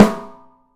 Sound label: OPEN PICOLO, 3D Drums